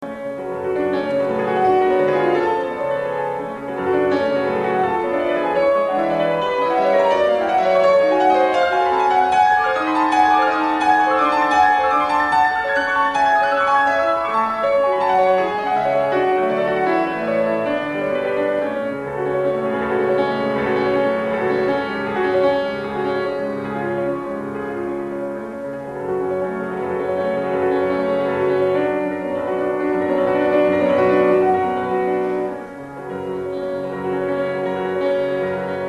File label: Prelude in F Minor Molto agitato